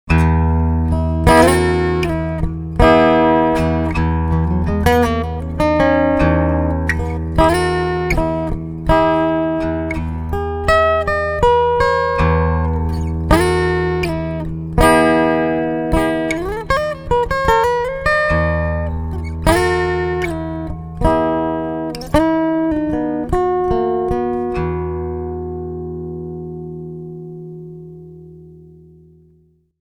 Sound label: Lydian Phrase